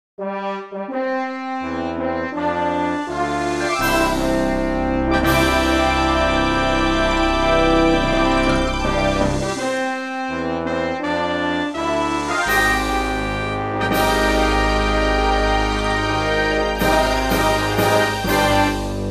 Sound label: orch